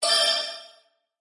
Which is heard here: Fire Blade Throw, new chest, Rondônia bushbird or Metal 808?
new chest